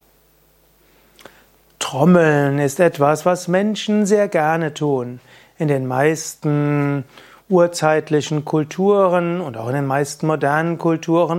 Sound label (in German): Trommeln